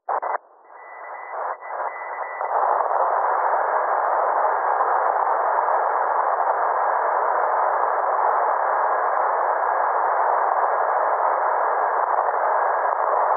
cw, morse

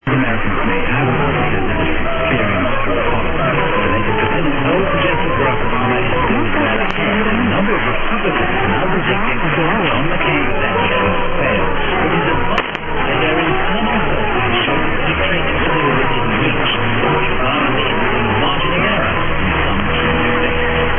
dx 909 romania over uk

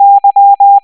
y tone

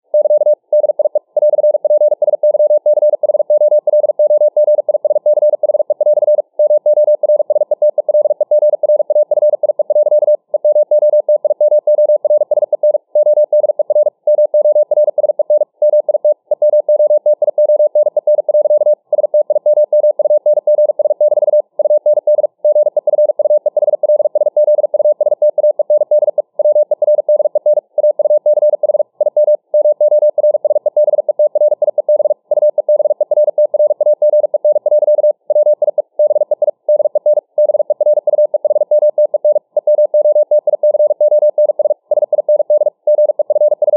Morse psycho 45 WpM

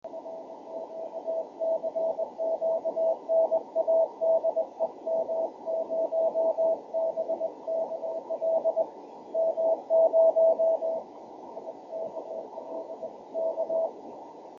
50.086 MHz CW, ham radio; M0BKL Great Britain

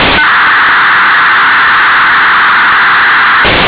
popsat

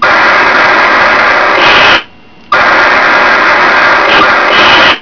vselp undecoded

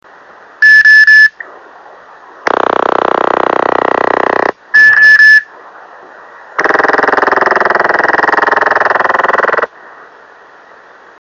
over The Horizon Radar 26520KHz

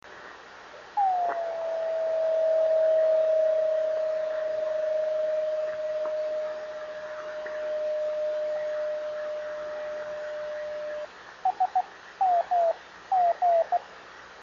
driftnet beacons; 26.530

